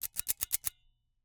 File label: Icecream Scoop, metal, mechanical, teeth, gear, squeeze, release, triple; Eiravaein Works; Vaeyan IV